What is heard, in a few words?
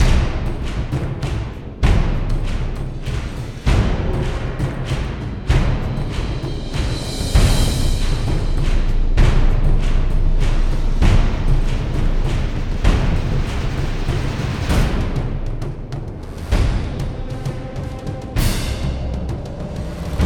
Bossfight Stage Action; Colosseum Bossfight Stage 01; Ryse: Son of Rome